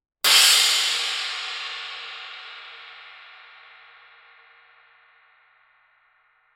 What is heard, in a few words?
paiste pst x 20 swiss medium crash edge